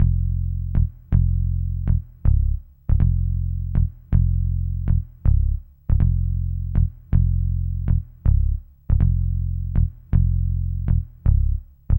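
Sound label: bass, F, Hip Hop & RnB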